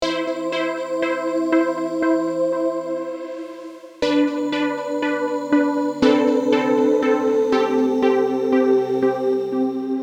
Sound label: attempt At Ambient